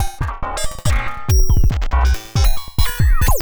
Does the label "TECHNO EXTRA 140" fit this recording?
Yes